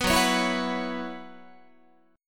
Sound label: F sharp 7th Suspended 4th, Guitar; strummed; 14,14,16,14,15,17; F#7sus4 Chord